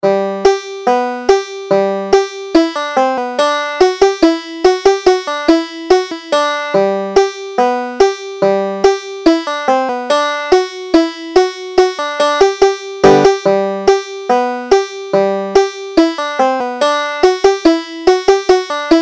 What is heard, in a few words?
pennsylvania banjo piece